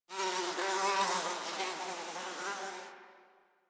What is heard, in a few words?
Insect Flyby; Ambience; One Offs; Insects; Warframe; Quests; The New War; Drifter Camp